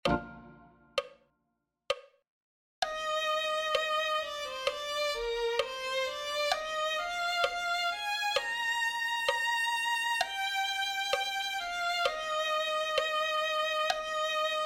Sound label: violin